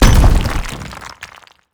impact stone break

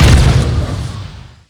jump

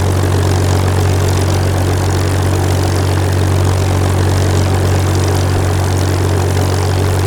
Aircrafts Engines Packard Merlin Prop; DCS

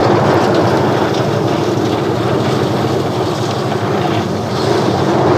Vehicle Engines; Red Orchestra Ostfront 41-45

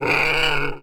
flinch; Rust

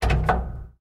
turret klank, tanks

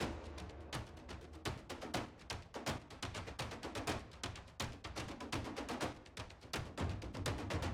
music interactive; World of Tanks

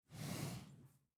sleeping; Rust

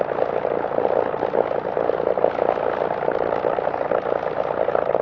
engine plane far; War Thunder; engine ai plane rpm00

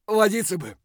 dehydrated; Escape from Tarkov

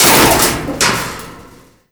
wall fan metal rattle; Call of Duty Modern Warfare

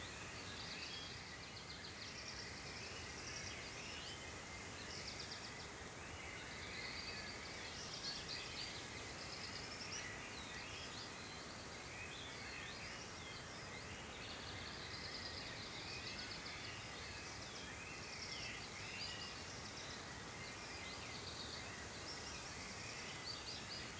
ambience forest day calm dry; Rust

